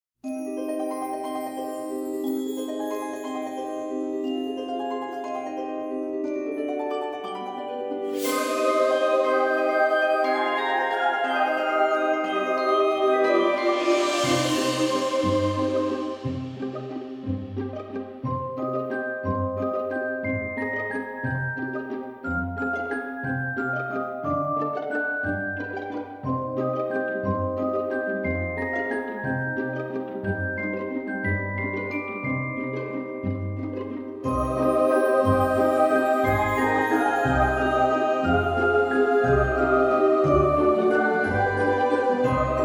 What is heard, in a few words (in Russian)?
Skazochnaya Muzika Melodiya zima zakoldovivaet